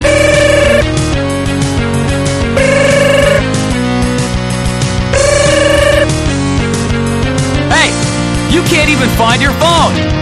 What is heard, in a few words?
Pirrrrr